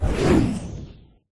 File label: boost; Minecraft